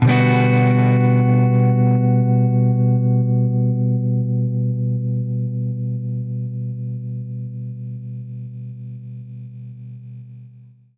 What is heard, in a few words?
Baritone Chord Bminb6, Guitar Chords; undercover samples